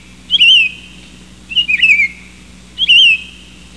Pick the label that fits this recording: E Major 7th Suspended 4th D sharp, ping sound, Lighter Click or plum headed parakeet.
ping sound